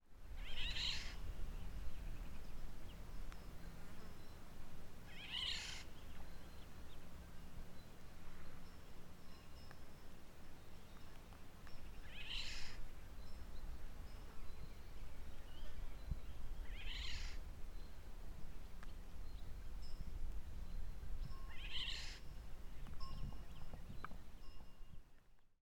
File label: Eurasian Golden Oriole